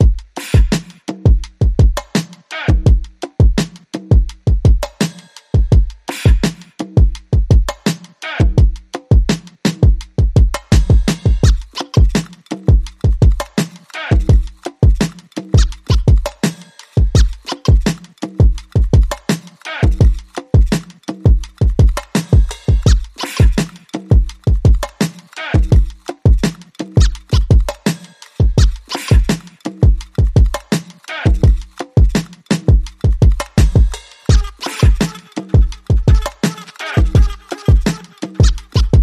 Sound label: Drums and Vocals Only